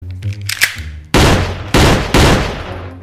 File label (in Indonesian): Efek Tembakan